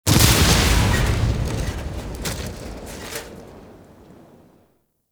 Destruction Explosions; Ambience; Warframe; Corpus Railjack

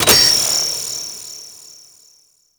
Detect Start; Warframe; The New War; Deacons

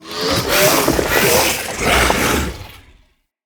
Attack Swing, Vocals; Enemy; Characters; Corrupted Sproutfolk

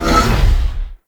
Large Door Close; Orokin

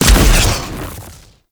Bow Arrow Rain Explosion; Ultimate Abilities; Madurai; Warframe; Focus

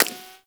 Bullet Impact Ricochet; Warframe

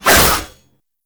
Fist Claw Alt Whoosh; Weapons; Warframe; Tenno; Incarnon

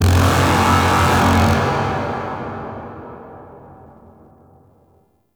Missile Barrage Warning; Enemies; Weapons; Corpus; Arachnoid Camper

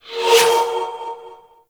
Spirit Primary Fire Whizzby; Warframe; Grineer; Thumper Bot; Sentient Spirits

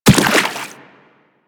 Run Water, Footsteps; Warframe; Teshin

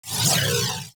Crewship Turret Proj; Warframe; RailJack; Corpus Crew Ship

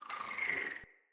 Acorn Whizz; Cinematics; Bromius; Bromius Tree Rub; Bromius Quest

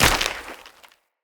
Hunter Bow Ranged Arrow Shatter; Weapons; Player; Bows; EnvoyHunterBowRangedMelee

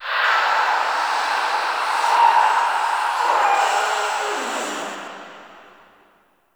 Exterior Foreground Infested C; Warframe; Ambience; NineteenNinetyNine

